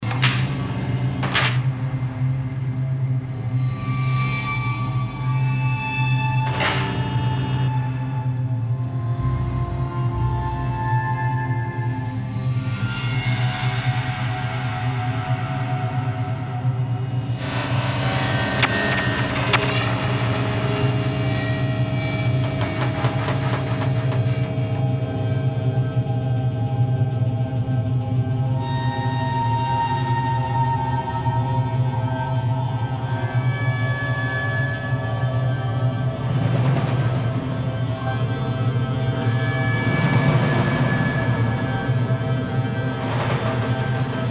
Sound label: rhythmicon